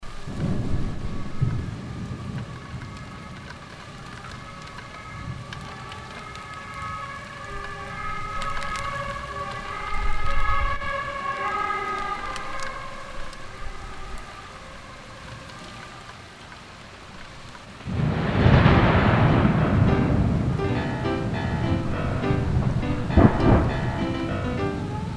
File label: piano thunderstorm